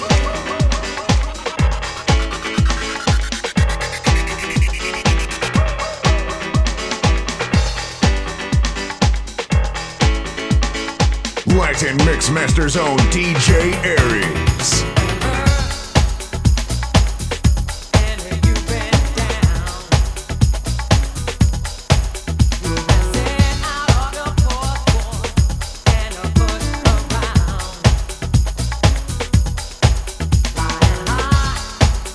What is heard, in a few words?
90'S DANCE HITS